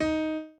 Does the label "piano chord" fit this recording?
Yes